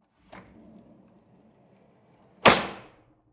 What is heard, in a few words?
drawer cutlery open draw hard